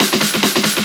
Fills, Loops 140 BPM

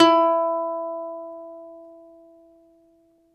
BALALAIKA, Russian Strings

